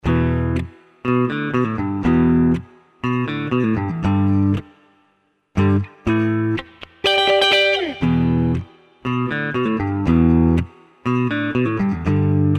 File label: Clean